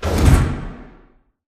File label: sliding door sm verb close start; beacon; Titanfall 2